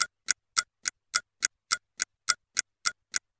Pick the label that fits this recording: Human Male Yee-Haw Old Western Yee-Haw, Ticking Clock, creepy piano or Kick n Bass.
Ticking Clock